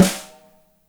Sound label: DRY SN; Spectrasonics; Burning Grooves; BG SNARES 4